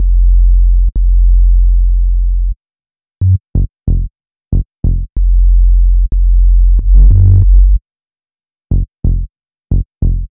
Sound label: Bass, Hip-Hop